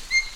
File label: bonobo peep rest